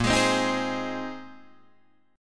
A sharp 7th Suspended 2nd Suspended 4th, Guitar Drop D chord